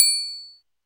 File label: triangle; Ableton Foundation FreePacks